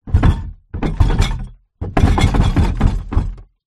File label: household trashcan recycle card glass tins movement bang